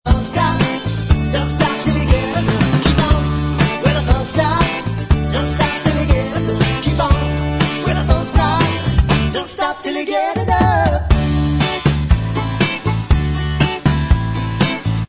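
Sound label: backing vocals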